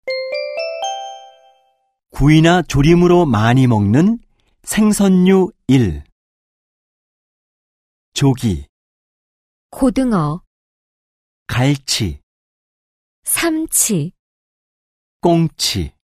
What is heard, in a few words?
K-POP